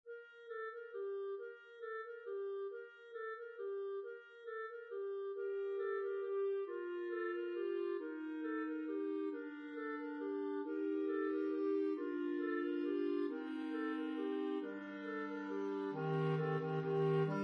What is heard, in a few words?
Carol of the bells Bass